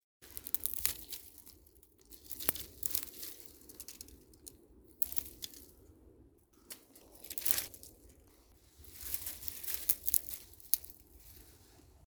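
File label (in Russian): someone is hiding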